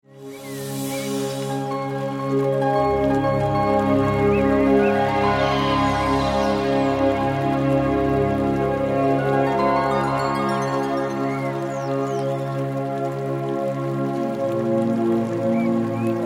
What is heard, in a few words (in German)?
Entspannungsmusik